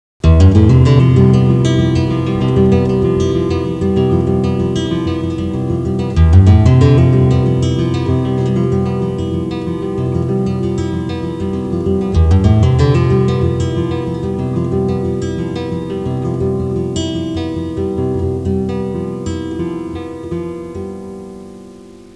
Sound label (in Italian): musica strumentale